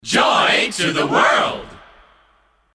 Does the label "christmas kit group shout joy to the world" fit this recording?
Yes